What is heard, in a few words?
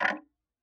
Tick, Clocks Kitchen Clock